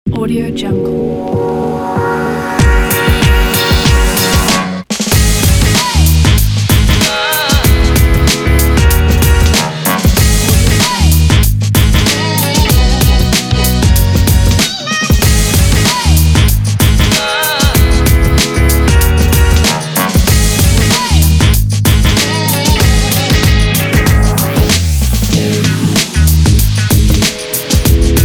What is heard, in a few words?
hiphop